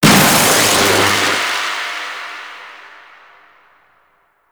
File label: Shipyards Lightning; Exterior; Warframe; Grineer Shipyards